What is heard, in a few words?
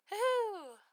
Woo Hoo